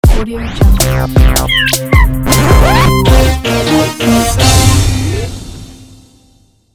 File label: techno fanfare ident